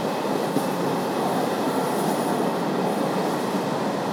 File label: train wagon wheels; factorio 2.0.55